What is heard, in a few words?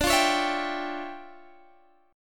D Minor Major 11th, down, Guitar, Half Step Down